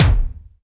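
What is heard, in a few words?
EFEX bass drum, FX DRUM; AKAI S6000 CD-ROM - Volume 1; DRUM RYTHM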